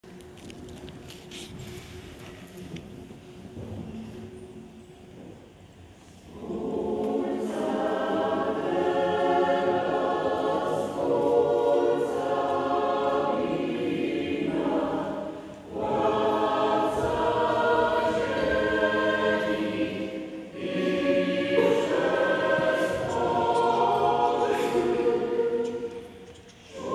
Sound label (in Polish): Chor Parafii; Tymbark